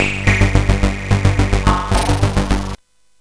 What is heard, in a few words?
Tube, CSG8580R5